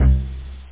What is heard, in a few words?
stab synth; OctaMED SoundStudio V1; jazzies samples